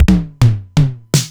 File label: Simmons SDS1000